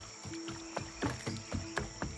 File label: run on wood; ori and the blind forest